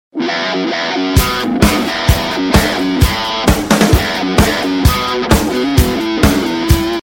Rock Sms

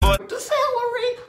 Celery

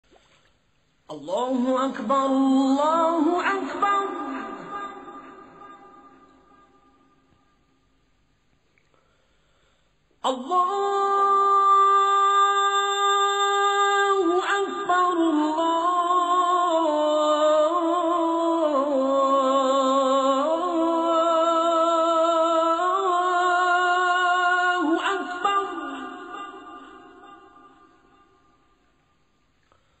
Azan

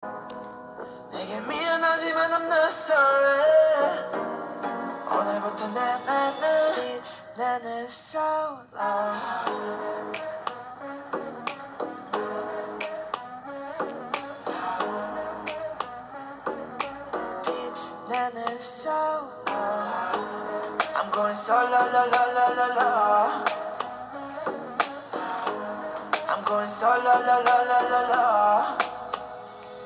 SOLO

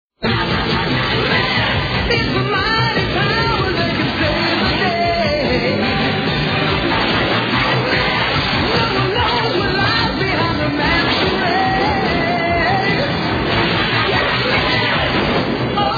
Chorus

